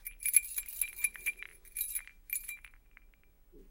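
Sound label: weak swaying of Chinese wind chimes from a light breeze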